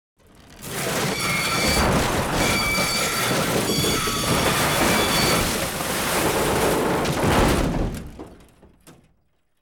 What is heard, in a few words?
metal stress creak, foley effects; Asian Show Sound Effects